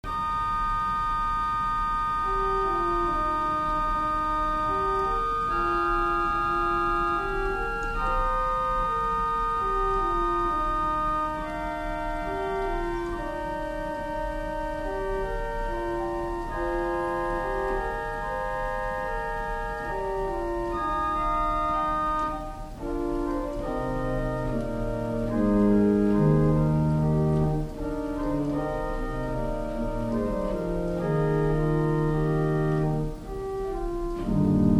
Organ Choir Dulcet

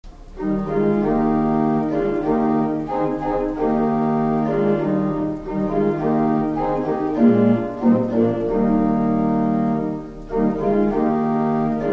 Organ Great Diapason

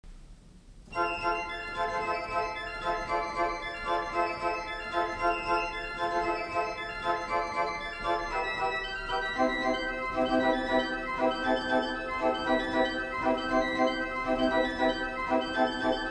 Organ Great Octave 4

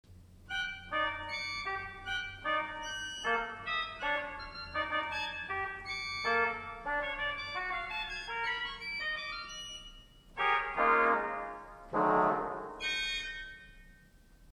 Organ Swell Oboe Clarion 4

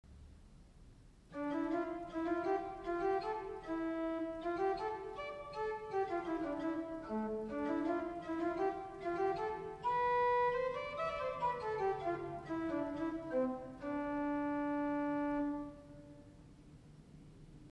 Tune Dorian

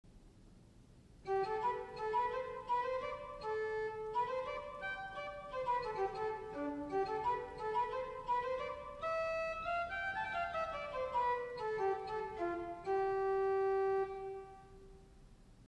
Tune Mixolydian

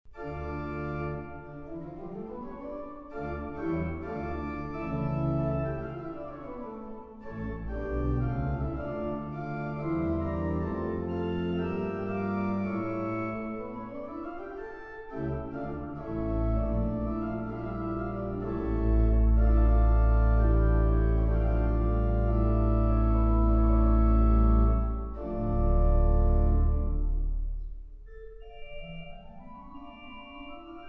Krebs Prelude and Fugue in d minor

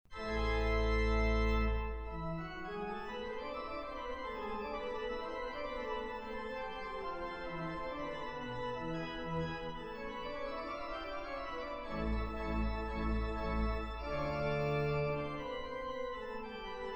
Krebs Prelude and Fugue in a minor; Bwv 559

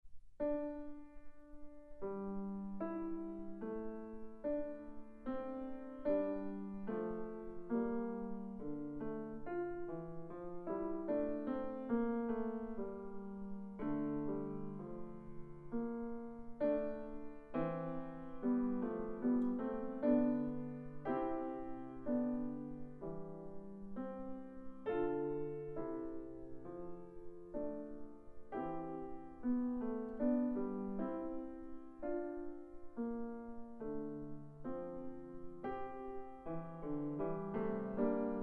Titelouze Veni Creator piano

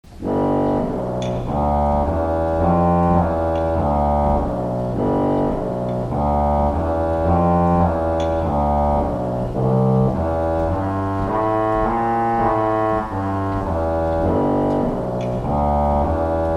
Organ Pedal Contra Trompette

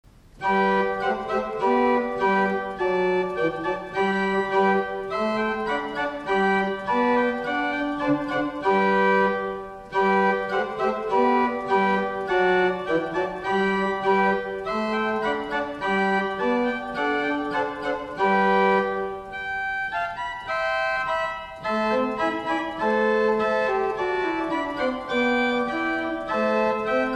Organ Positiv Prestant 4

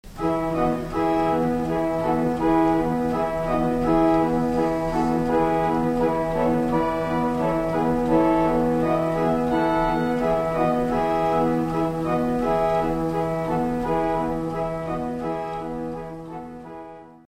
Organ Swell Viola de Gamba

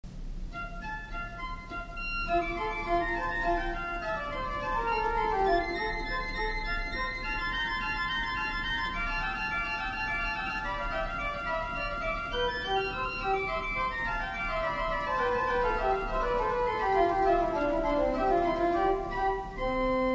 Organ Choir Klein Octave 2

